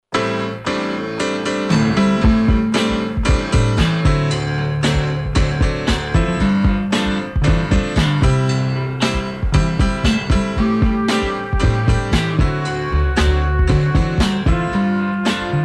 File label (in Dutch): instrumentaal